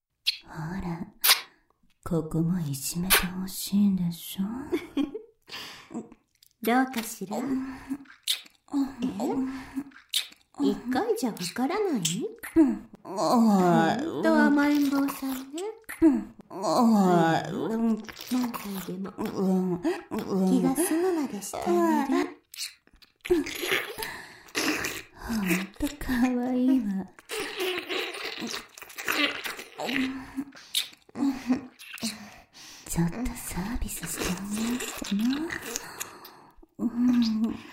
立体音響 (閉鎖空間) 耳舐め,ASMR; 完全オナニーサポート!～ぽっちゃり熟女ななこ&AV女優まどか～; まどか＆ななこ耳舐め

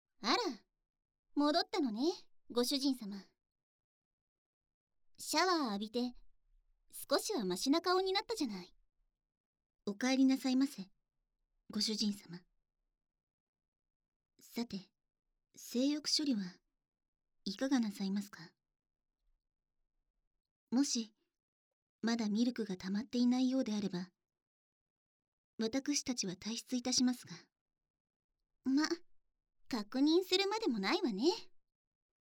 両耳舐め奉仕, ASMR; SE無し

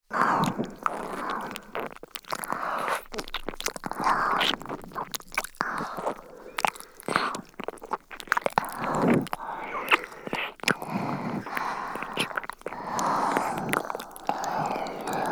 耳舐め 鼠径部マッサージ エンドレス; アレンジトラック

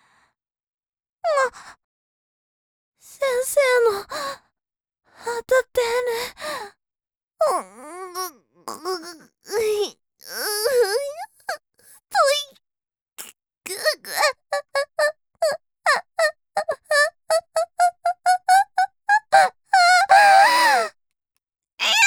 悲鳴、苦痛 ボイスのみ; ダイジェスト処女膜ビリビリから快感に

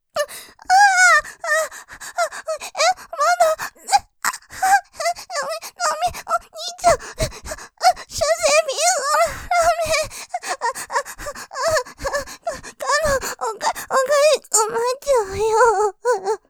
絶頂喘ぎ; 追撃の中出しピストンで慌てちゃう・射精ピストンしゅごい; ループで楽しむ！シェフの気まぐれ抜き抜きコース; モノラル

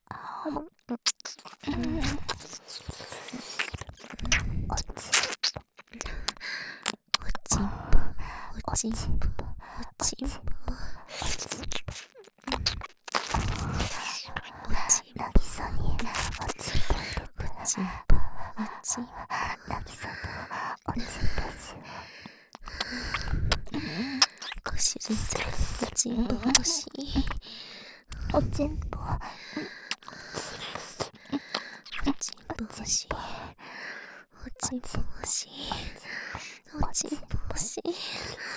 耳舐め; 凪彩と文の淫語ヘヴン; 左右反転差分; おちんぽ

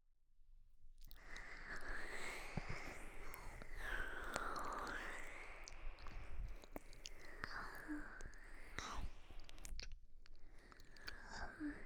あむあむ 左 マッサージ 右

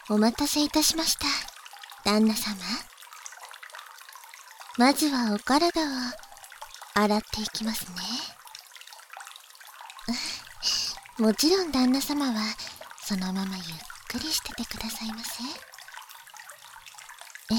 洗髪（バイノーラルマイク収録 効果音あり大きめ）

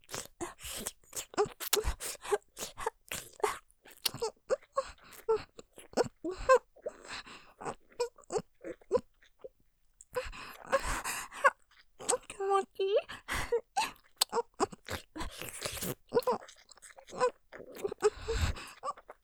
フェラ音マシマシ 切なそうに・気持ちいい; おかずにスパイス２; 勢いマシマシ

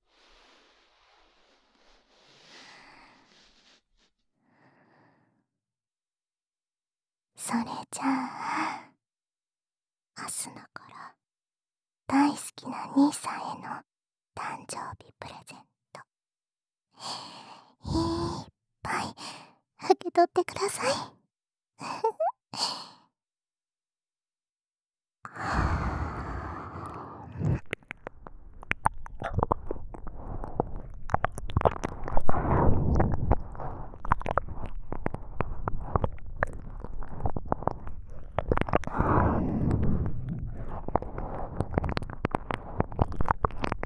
耳”圧”舐め; 明日菜

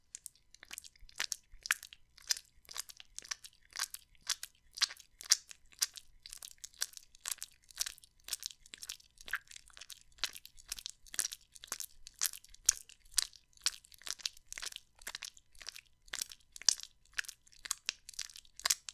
ノーマルピストン

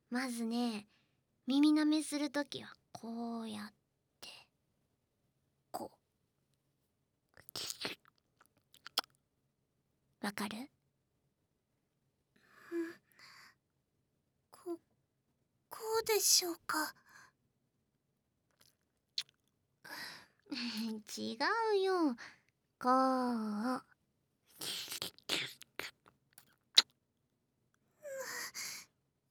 耳舐め指南; アキちゃんの耳舐め指南

